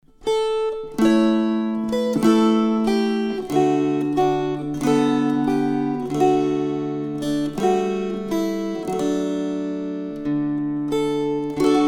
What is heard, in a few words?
String Choir